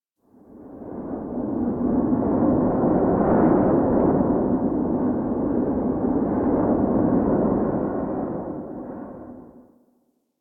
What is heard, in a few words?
Forest Half Open Cave Wind; Warframe; Grineer Forest